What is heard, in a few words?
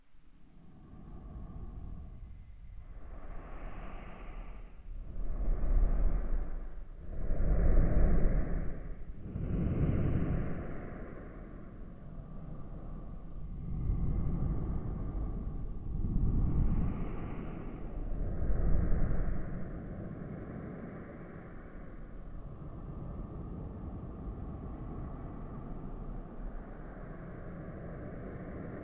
rock